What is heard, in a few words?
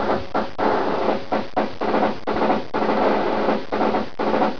snare